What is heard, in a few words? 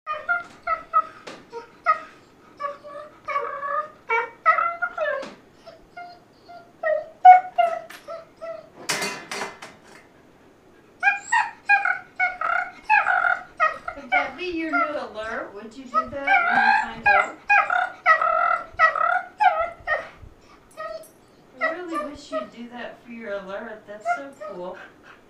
Dingo trill; Lola